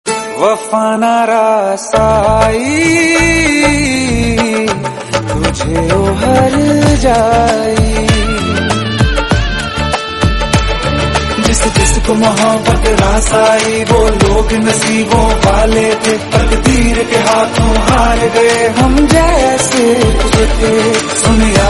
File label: broken hearts hindi song tone ringtone; bollywood